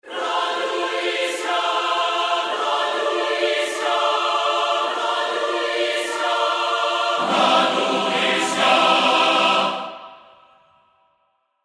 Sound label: Church Choir Singing religious 101 F; acapella